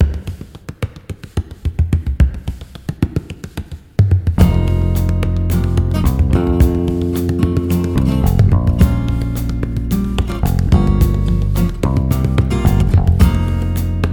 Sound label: mixolydian b6